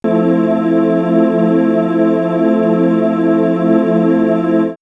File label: Swirls, pads; Sylvain; X-Static Goldmine